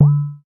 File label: synthetic pop